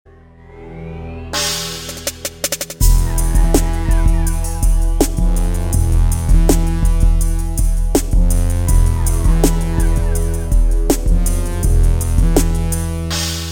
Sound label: hip-hop E to F